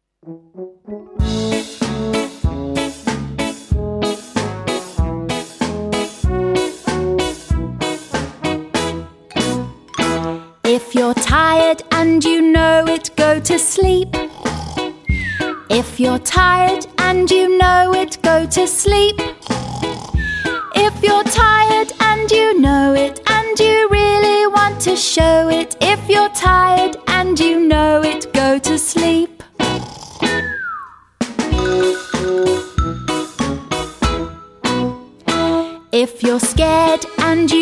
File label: karaoke